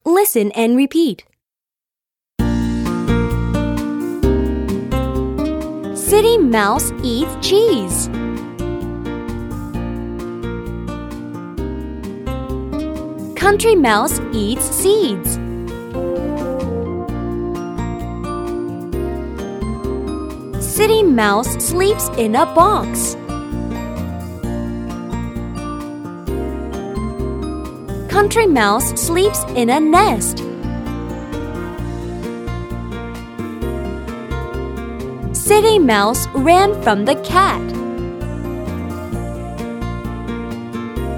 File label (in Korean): 영어여 챈트